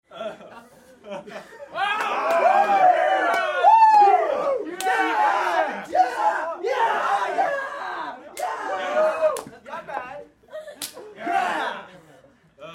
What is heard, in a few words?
Crowd Teens Boys Party Reactions Whoa; Crowds